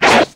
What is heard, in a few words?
SWISH SEQ, swishes; Total Control - Action Cutz